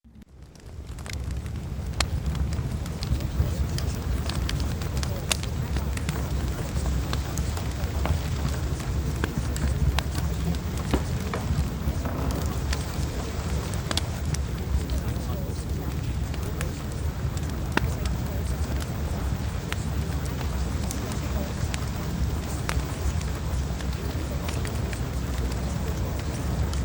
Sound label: Fireplace